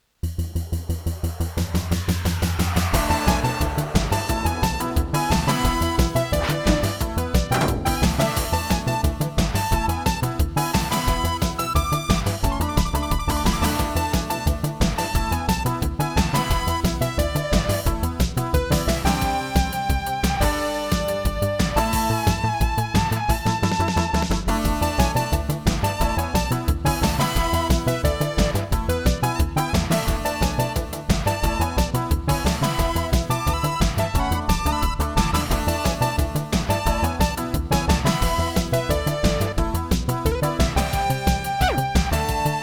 Roland CM-500; Willy Beamish

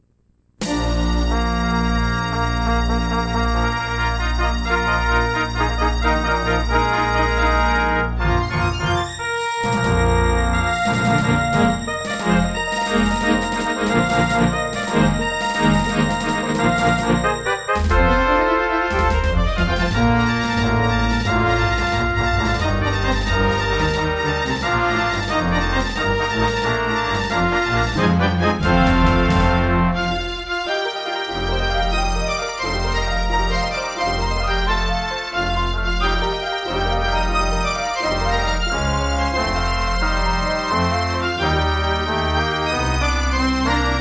Intro, Serdaco E-Wave; Dark Forces

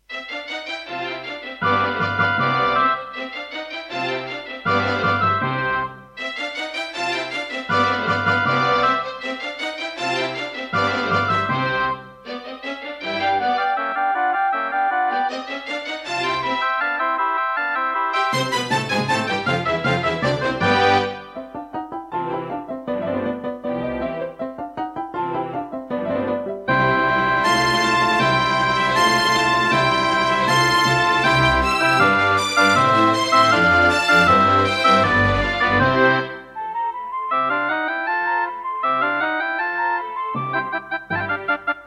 Yamaha MU2000EX XG MODE; classical; Mozart; Concerto K467 partial

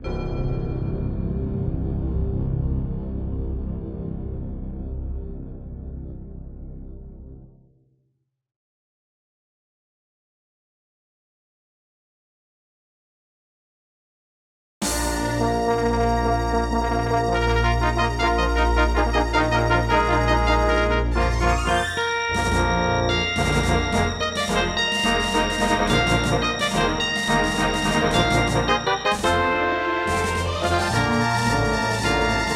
Intro, Casio LD-80; Dark Forces

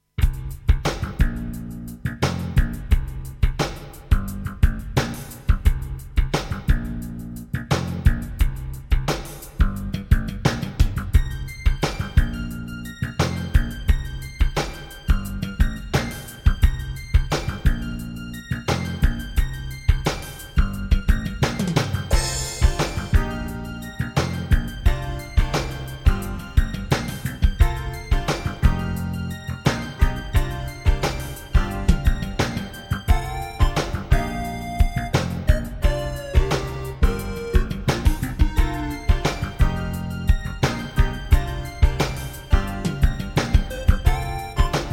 EMU Sound Engine

